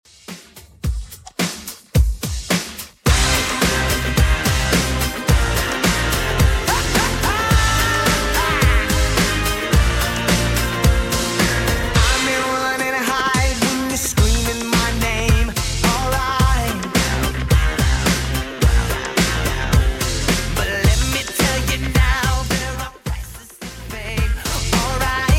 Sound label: 90s Redrum 108bpm